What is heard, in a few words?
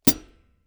hihat foot close ff; Percussion; Cymbals